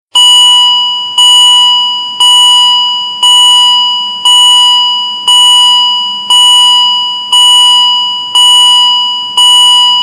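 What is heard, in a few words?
Door Open Ringtone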